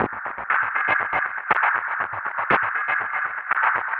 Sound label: Processed Perc; Drums & Percussion; Processed Percussive; Sonic Foundry (Sony Creative Software); Electrocution; Beat Components